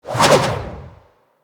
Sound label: Melee Charge Swing Whoosh; Weapons